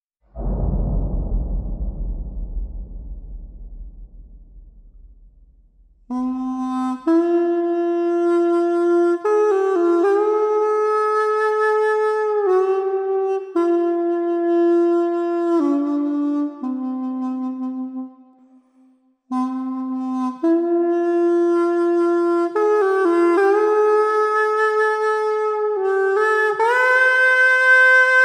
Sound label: pocket sax